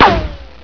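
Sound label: ricochet, weapons; Source Engine; bshift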